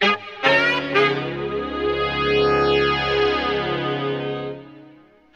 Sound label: sax, phonky loops